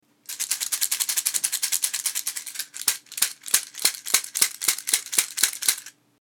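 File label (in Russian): шейкер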